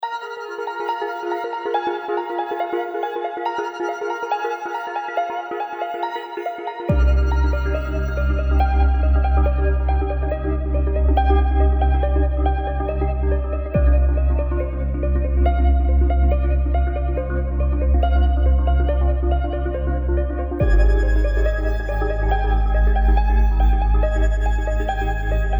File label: rain rain